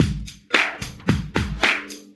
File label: Breakbeats loop, 70_s Breakbeats